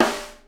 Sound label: SNARE, Live Snares